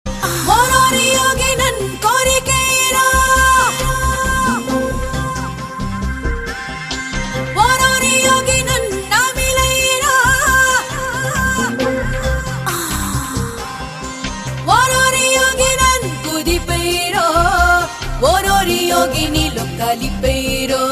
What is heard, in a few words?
Telugu Ringtone